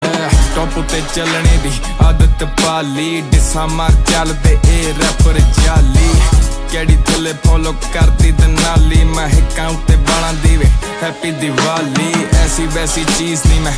Ringtone

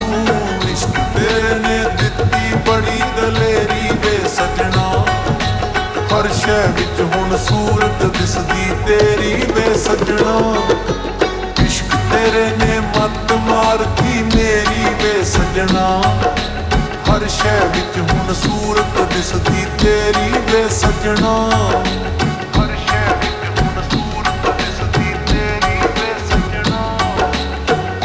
Ringtone Slowed Reverb

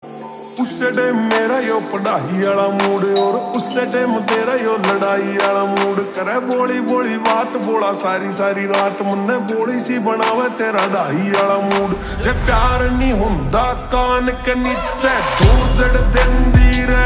Slowed Reverb

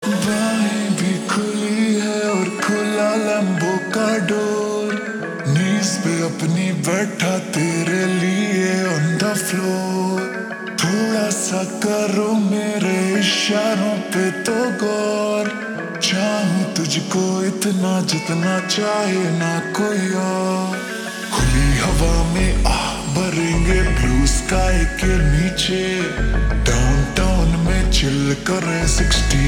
Ringtone Slowed Reverb

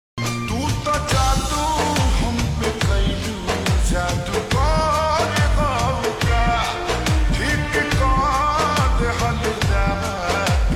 Slowed Reverb

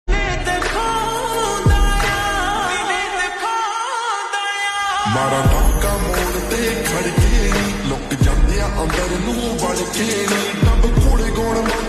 Ringtone

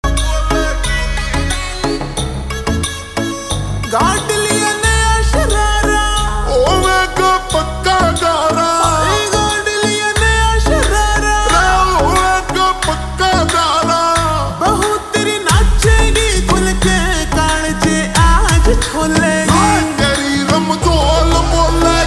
Ringtone Slowed Reverb

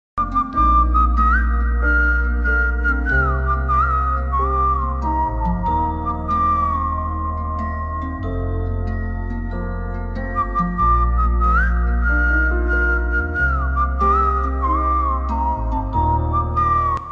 Instrumental Ringtone Slowed Reverb

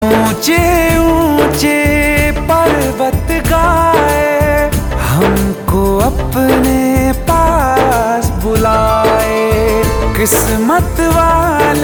Ringtone

